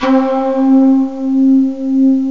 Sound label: chiffer